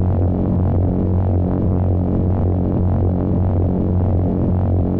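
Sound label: space background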